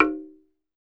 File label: TUNG HI, Tongue Drums; E-MU Producer Series Vol. 7 – Old World Instruments